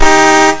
horn old; garrysmod

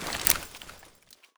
bolt catch, weapons; garrysmod

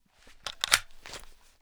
sg550 mag out; garrysmod

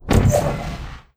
shoot rope; garrysmod

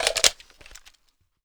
m82 mag in, sniper; weapons; garrysmod

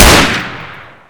m4a1 unsil, weapons; garrysmod

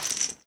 m249 belt; garrysmod

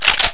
fnfal bolt pull, weapons; garrysmod

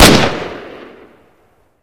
galil shoot; garrysmod

